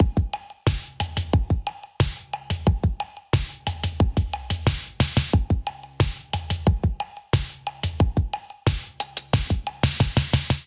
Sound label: Brand New Beats Kit 90bpm, Drums; MIDI Clips; Drive and Glow; Ableton; Factory Packs